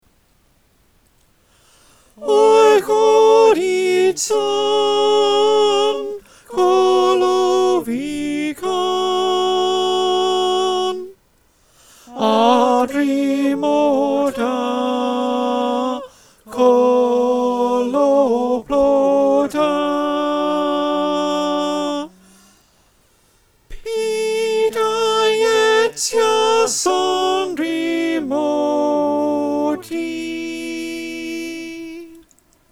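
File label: Alto, choir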